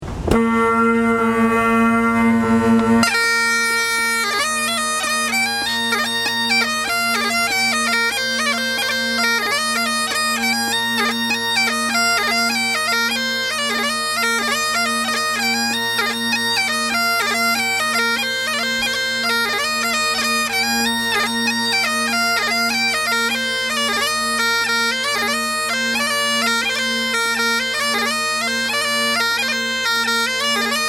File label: pipes